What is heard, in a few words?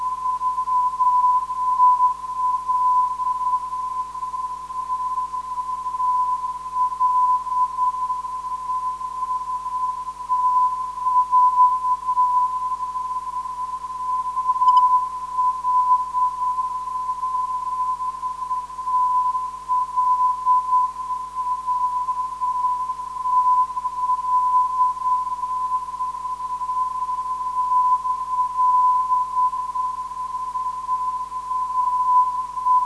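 TL 384kHz, non-directional beacon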